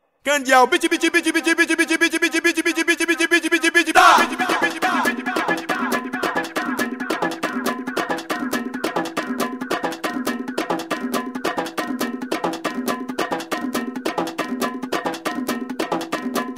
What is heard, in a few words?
Breakbeat Mix; Favelatecno